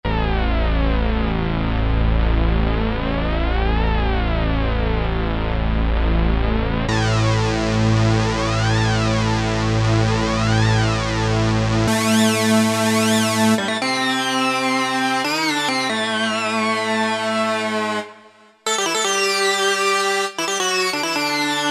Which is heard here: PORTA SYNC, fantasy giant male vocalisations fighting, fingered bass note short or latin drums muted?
PORTA SYNC